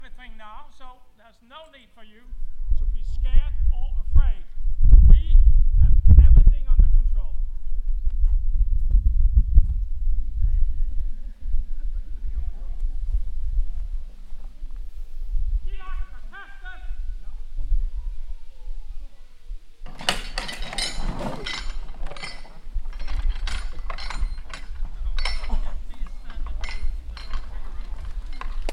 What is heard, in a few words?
Small trebuchet